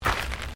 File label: Footsteps Gravel Step